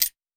2 layers 2 percussions; M8; percussion and foley

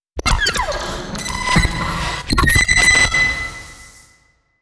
glitch

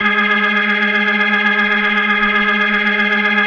G#3F, B3, Vintage Keyboards

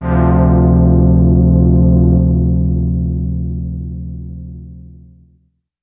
Strictly Synthe, Excessive Stereo; E-MU Producer Series Vol. 6 – Analogia Project

